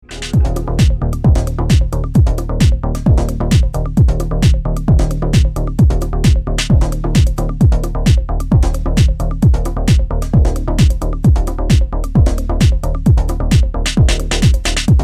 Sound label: Techno Bass